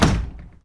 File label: slide door